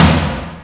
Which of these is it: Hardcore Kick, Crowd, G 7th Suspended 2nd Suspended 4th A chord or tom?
tom